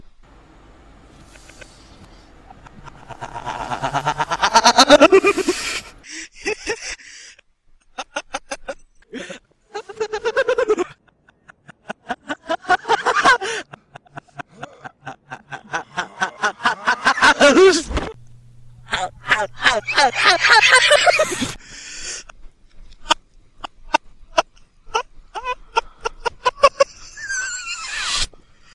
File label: REVERSE LAUGHTER